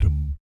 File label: BS DUM, Vocal Planet Jazz & FX; ILIO; BASS DUMMS